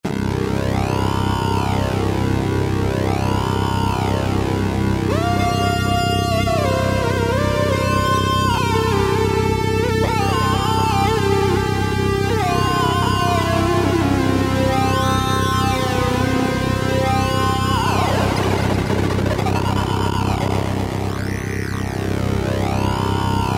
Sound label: Heavy Drone, Roland V-Synth; Factory Patches